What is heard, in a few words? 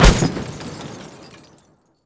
platform Light explo; impacts explos